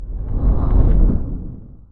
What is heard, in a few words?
scroll